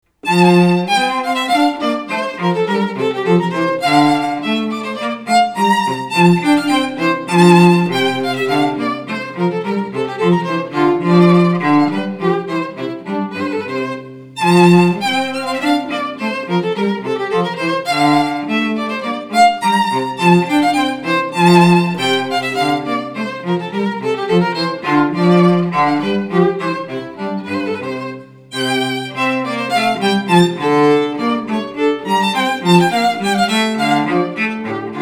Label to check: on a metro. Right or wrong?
Wrong